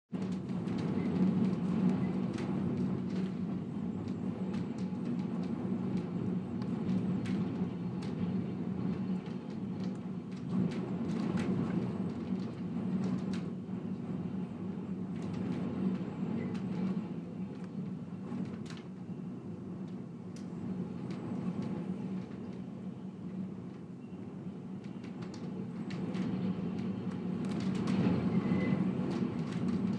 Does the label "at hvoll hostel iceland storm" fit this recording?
Yes